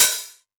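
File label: Hat Open, Novation Drumstation; DrumstationV1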